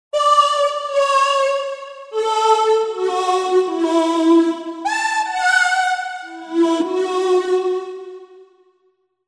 Squeezy; TURBO VL

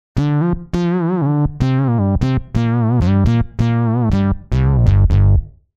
Moog Bas; TURBO VL

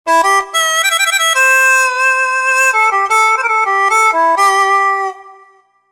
Harmonica; TURBO VL

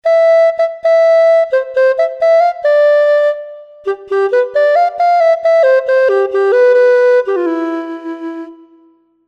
Recorder; TURBO VL

